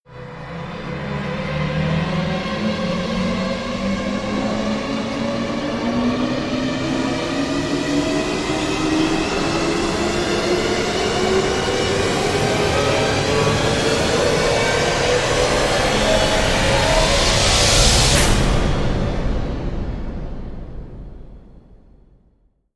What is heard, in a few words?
rise long; galactic